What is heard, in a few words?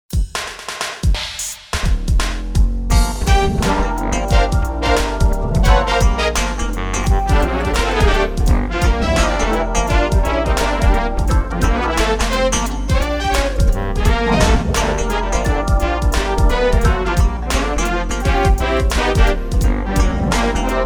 groove